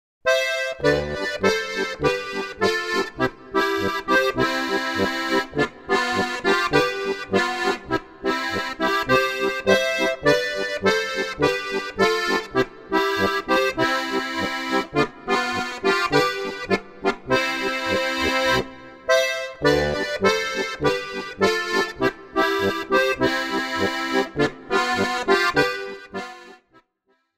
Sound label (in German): Walzer